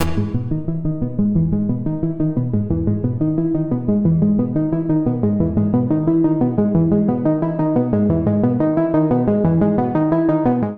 Dark Forest E Major 89 bpm, Bassline; Tonal; MIDI Clips; Drive and Glow; Ableton; Factory Packs

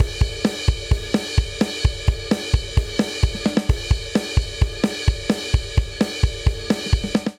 Driving Rock Chorus 130 bpm, Drum; 4 4; MIDI Clips; Ableton; Factory Packs; Drum Booth

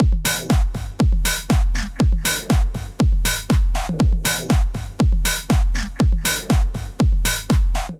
Kit, drums; Tomorrow; Ableton; Factory Packs; Punch and Tilt

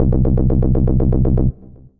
Bassline, synth bass; System Bassline; Ableton; Factory Packs; Singularities

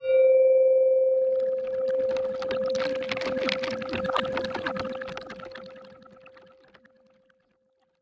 MPE Underwater Textures; Strung Together

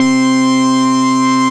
mini funk solo, synth lead; Bass; Ableton; Creative Extensions

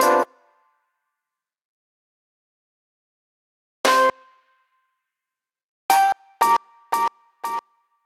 Loose Foot Hits, Breakbeats; Ableton; Breakbeats by KutMasta Kurt

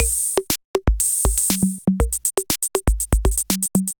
C78 Kit groove 120 bpm; MIDI Clips; 111 - 130 bpm; C78 Bullet Kit groove; Ableton; Factory Packs; Drum Essentials

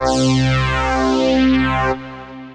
Bi-Phase Sector, Synth Pad; Ableton; Chop and Swing

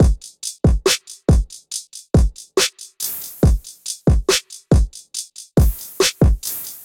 Kit 70 bpm, Trap Drums; Quasar Kit; Trap Drums by Sound Oracle; Ableton; Factory Packs

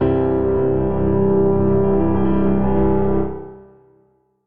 Ending Tremolo C Major 87bpm, Grand Piano; MIDI Clips; Ableton

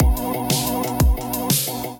Diatribal Kit, Drums; Ableton; Drive and Glow

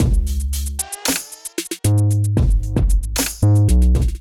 Kit groove 114 bpm; MIDI Clips; 111 - 130 bpm; Chekov Kit groove; Ableton; Factory Packs; Drum Essentials

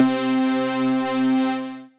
Fuzz Pluck, Synth Lead; Sledge Fuzz Pluck; Ableton; Factory Packs; Singularities

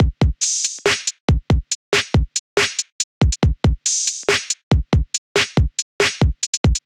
MPE Opulent Kit 70 bpm, Trap Drums; Trap Drums by Sound Oracle; Ableton; Factory Packs

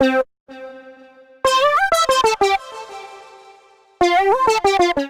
D Minor 94 bpm; MIDI Clips; Tonal; Melodic; Evening Casual; Ableton; Factory Packs; Chop and Swing

